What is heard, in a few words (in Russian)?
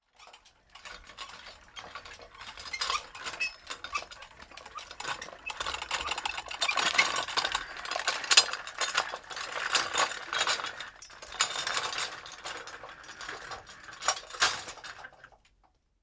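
катят тележку с посудой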